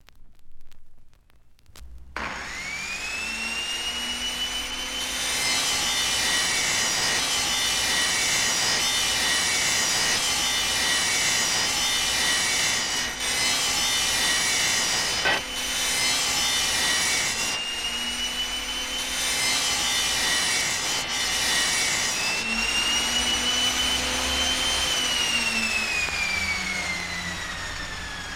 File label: Grinding Wheel